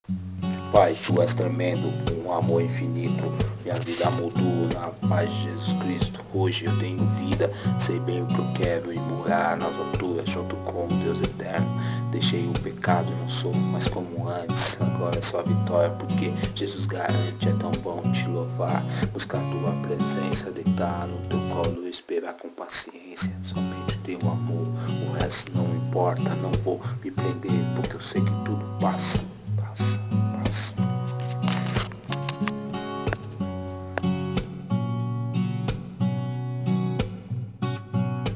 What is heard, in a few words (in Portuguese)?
rap gospel